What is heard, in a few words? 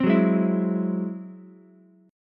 Ab Augmented 7th Ab down Ukulele; strummed; Ab+7; JGuitar